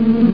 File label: hornet d; landslor